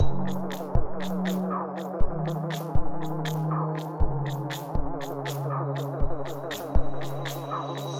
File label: Grain Fart